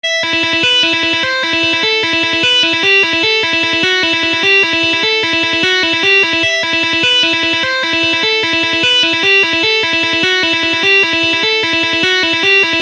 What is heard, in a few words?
Lick